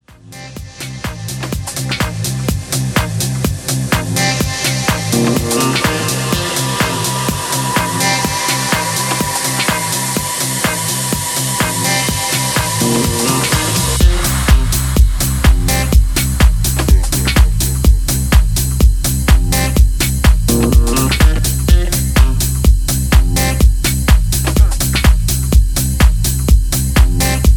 Instrumental Mix

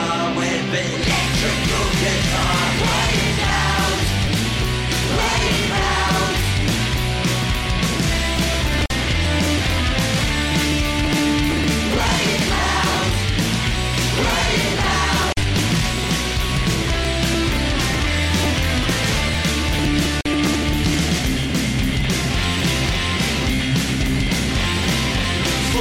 Electrical Guitar

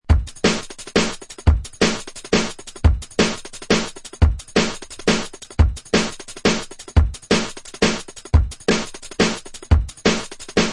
Fat Breaks Steppaz; jungle breaks; ray keith dread jungle breaks vol 1 60 beats for dubplate clashes battle junkies

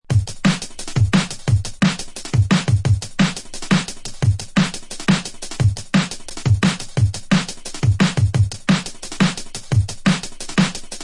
Kik An Snap Hats Included; jungle breaks; ray keith dread jungle breaks vol 1 60 beats for dubplate clashes battle junkies

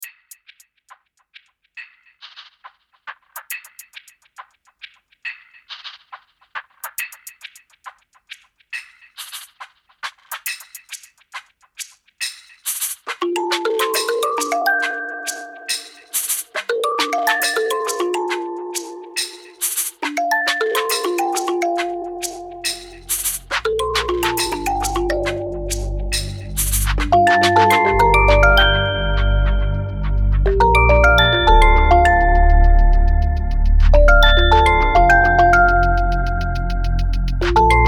Instrumental; British Instrumental

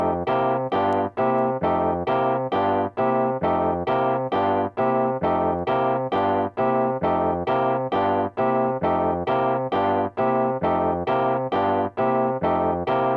Rhodes, scratch sounds

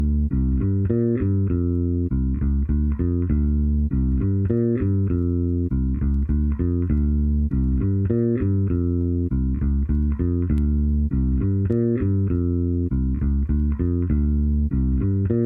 Lock Groove

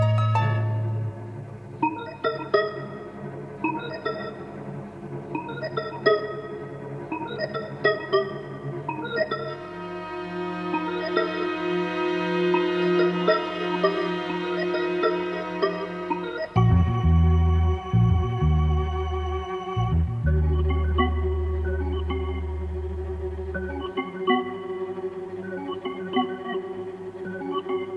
rhythmical suspense

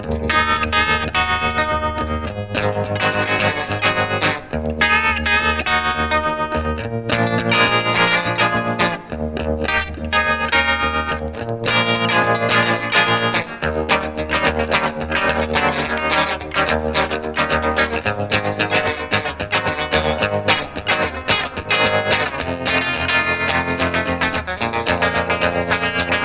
Gospel Tune, guitar banjo

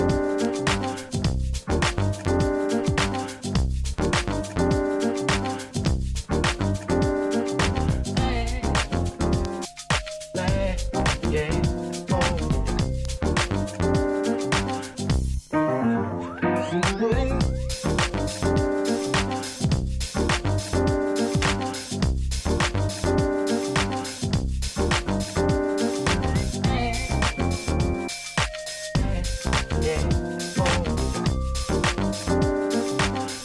(instrumental)

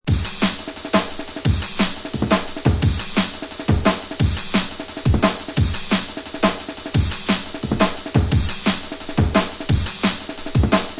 Sweeping Amenz, breaks; jungle breaks; ray keith dread jungle breaks vol 1 60 beats for dubplate clashes battle junkies

